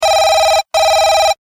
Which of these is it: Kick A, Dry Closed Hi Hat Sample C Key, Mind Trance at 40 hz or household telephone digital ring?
household telephone digital ring